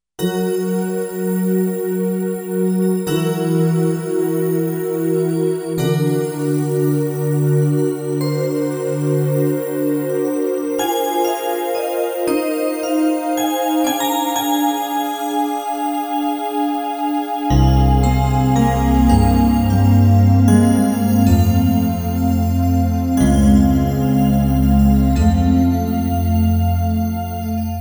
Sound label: Romantic Sparkles